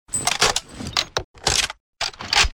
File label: ak47 reload; weapons